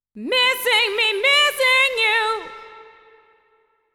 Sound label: voice girl